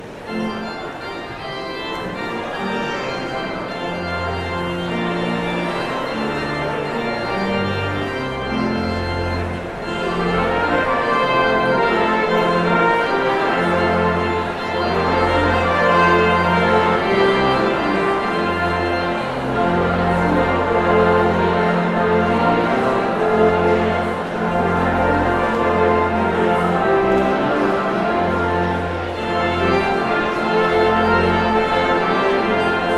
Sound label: Postlude